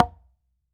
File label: H CONGA SL, CONGA